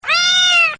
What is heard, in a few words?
CAT